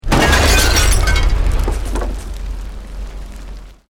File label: Explosion Medium Brick Wood Glass; Created explosion effects, house, building or vehicle effects; Bomb; Bombs